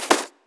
card play

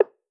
score tick lesser

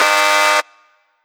time up

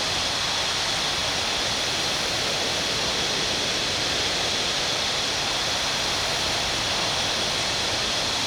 Rocket Flight Loop; Items; Shooter

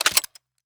Shotgun Pump; Shooter

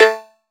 roulette

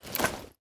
Bow Unequip

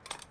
Medium Weapon Aim

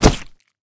Bullet Impact Flesh

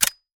Assault Rifle Dry Fire

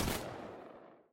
Medium Weapon Shot

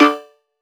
roulette

